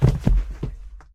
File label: body Fall; casualtiesunknown